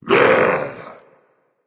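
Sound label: tongue, non-verbal; smite; voicepack; merlin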